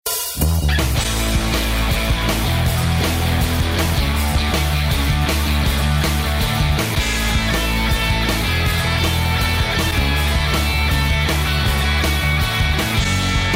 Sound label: Punk Fun Rock